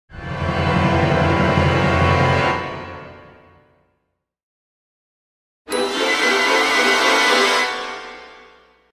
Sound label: Music transition - Intense orchestral horror chord; Hollywood Edge Sound Designers Tool Kit